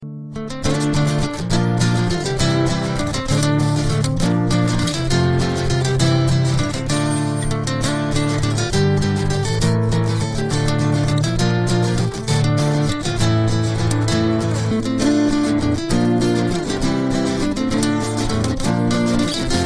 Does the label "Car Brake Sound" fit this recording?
No